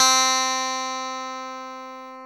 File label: C3 Strat, clean; InVision Interactive; Lightware VOL-1; Instruments & Percussions